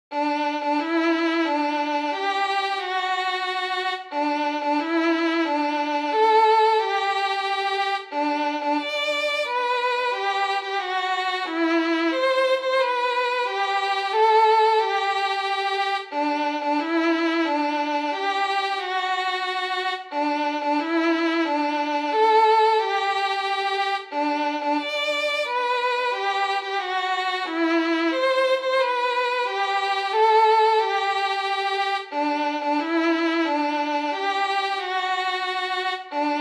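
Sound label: solo Happy Birthday, violin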